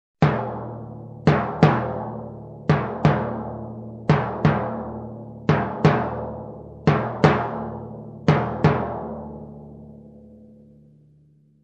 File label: Suspenseful Timpani groove